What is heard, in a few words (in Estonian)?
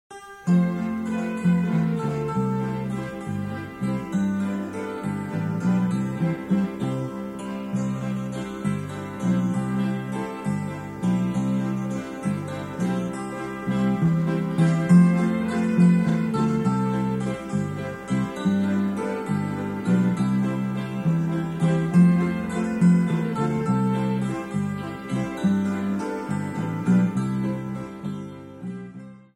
Kevade valss